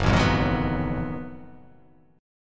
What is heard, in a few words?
Gb Minor Major 13th, GbmM13 chord; strummed; JGuitar